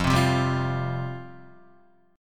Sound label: F Suspended 2nd F Guitar; Fsus2 strummed; JGuitar